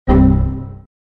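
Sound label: error sound; zvuk oshibki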